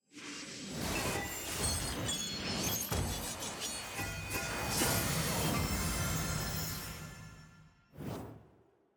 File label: rank up; champion mastery notifications; cm rank up